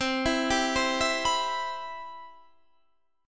C Major 7th, Guitar Half Step Down

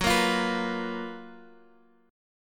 F sharp Major 11th Guitar Half Step Down

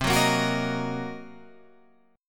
C Minor 7th Flat 5th Guitar Half Step Down, chord

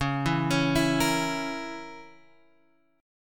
Db Minor 6th Db down Guitar Open G

